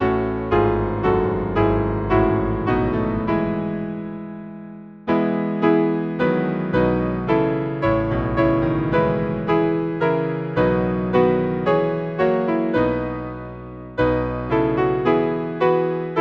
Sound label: Hymn, piano; Hark a Thrilling Voice is Sounding; Merton